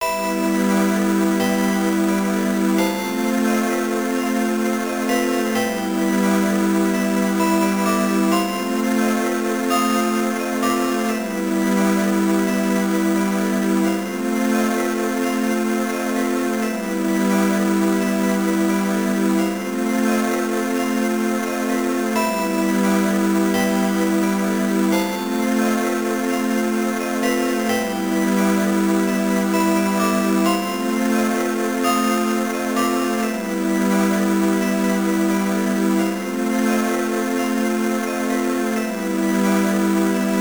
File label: BG Cave; mine sweeper gba homebrew gamerip